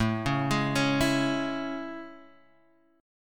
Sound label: A Major 7th A